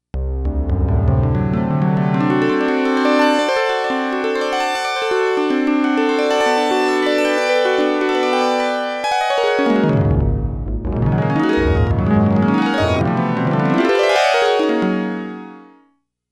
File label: Harp